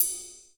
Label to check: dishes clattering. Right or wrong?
Wrong